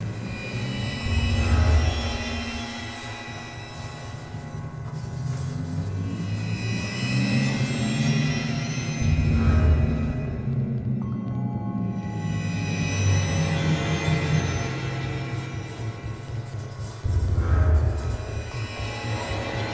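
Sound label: scary loop; transformers war for cybertron gamerip; MATRIX OF LEADERSHIP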